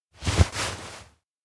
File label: hat adjust; anemoi